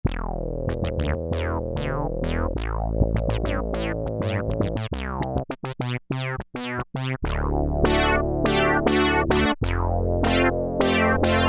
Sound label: Resonant Curtains; synthesizer; Guess the synthesizer game